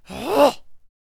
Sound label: Void Cultist Attack Vocals